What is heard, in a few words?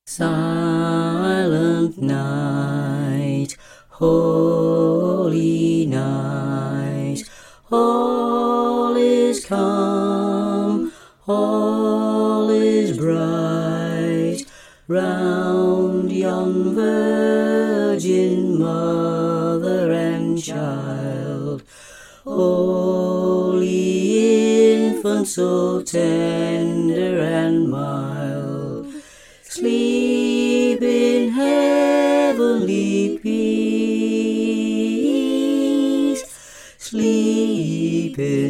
Men; Carols; Choir Harmonies; Silent Night